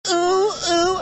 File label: Moan